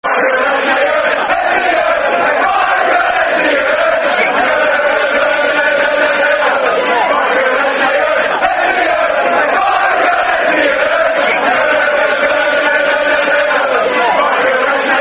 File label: Football Chants (Soccer Song); Michael Essien; Chelsea Cfc